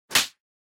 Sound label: Hit Slap, Dry; 打撃 平手打ち; ドライ